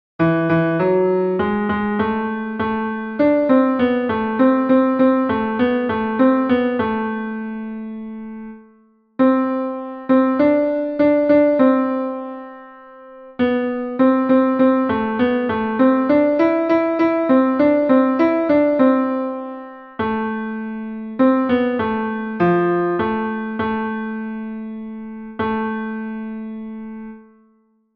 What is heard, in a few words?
piano; mon todam va sh ko t nor